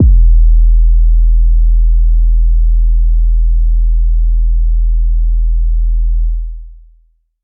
phonk kicks oneshot - 808 e, Specially designed for phonk type beats, these nasty, layered 808 o; kick drum